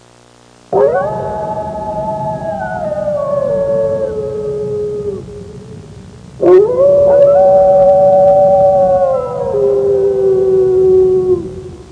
Wolf pack howling in the distance

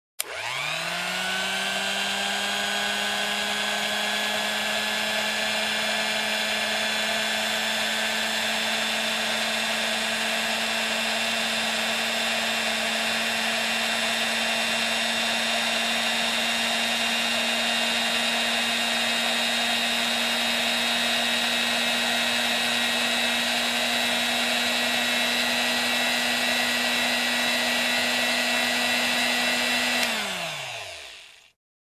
Electric Hand Mixer turned on

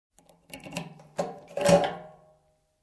Opening Motorcycle compartment

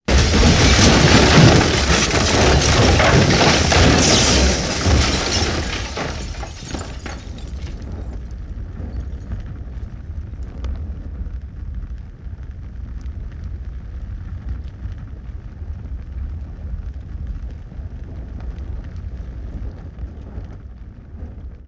Explosions wood glass debris

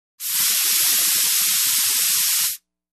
Electric Balloon Pump

